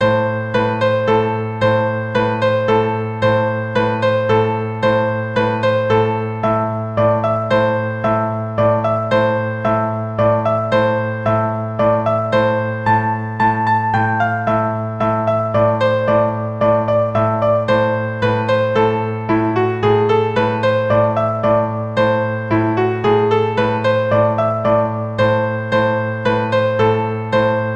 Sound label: Carol of the Bells, quarter note l.h